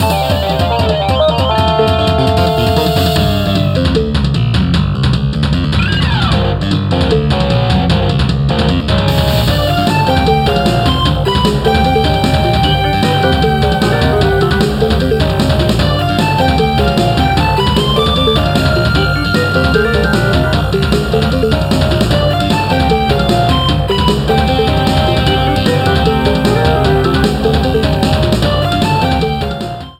Wario shipyard underwater, Background music

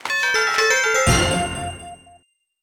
Gift Box Open Gold; SM3DL; Kino

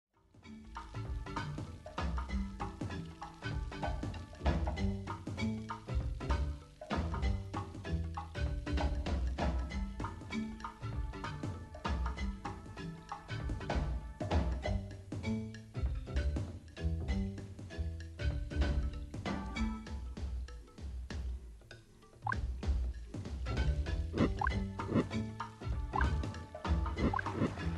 Rap Instr, Music sample

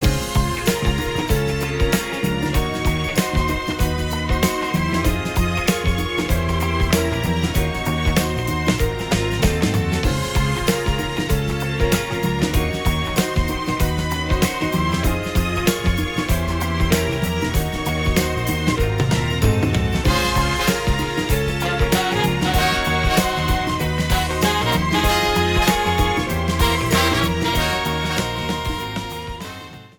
Water Land Arrangement

